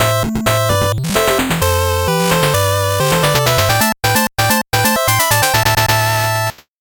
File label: 8bit jingle slow